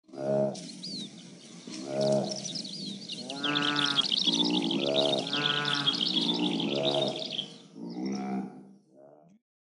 saiga

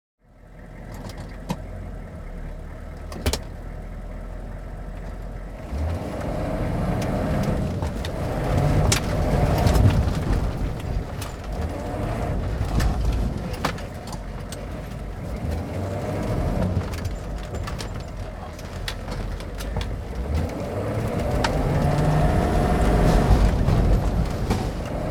hammer

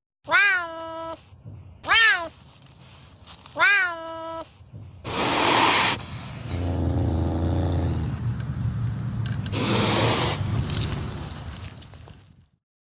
serval

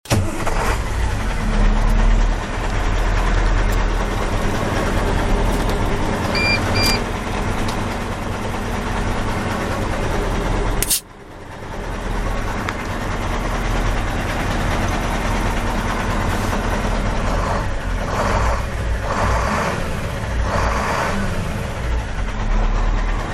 Kamaz

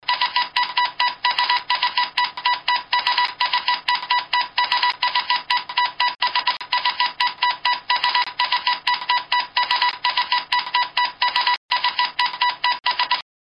SOS

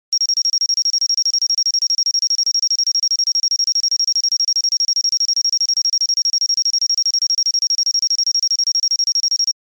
detonator

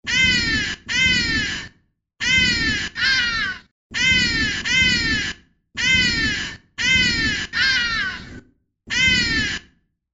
ibis

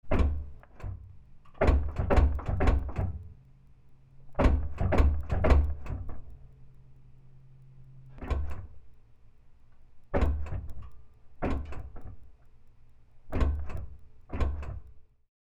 pedal

